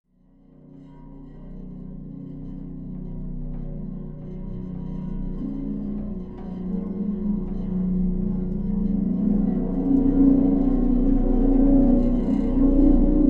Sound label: harmonic